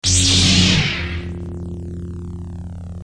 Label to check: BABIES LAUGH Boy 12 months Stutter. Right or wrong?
Wrong